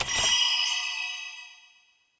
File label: click